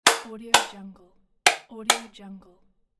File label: switch click